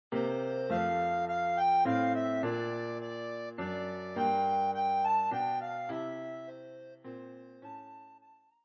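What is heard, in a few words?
We wish you a Merry Christmas, soprano recorder; Klavierbegleitung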